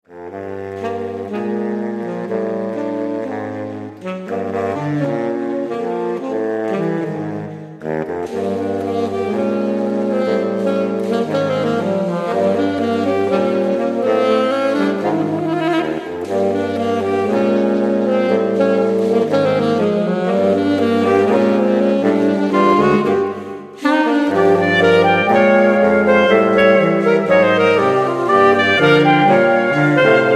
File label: Jazz, saxophone